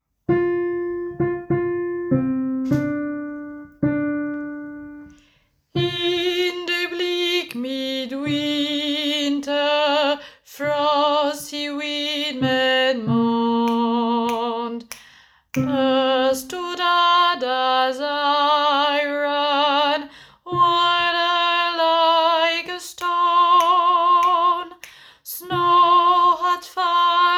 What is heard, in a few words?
In the bleak midwinter alto